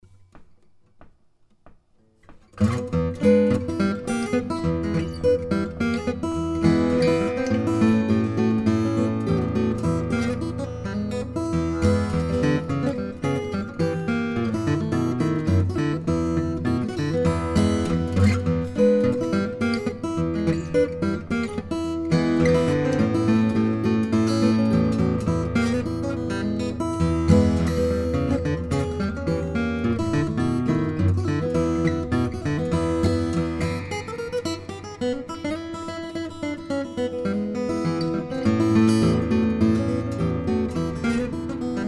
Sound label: bouncing blues